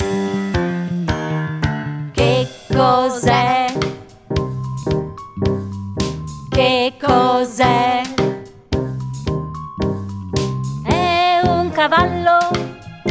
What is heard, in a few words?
song italian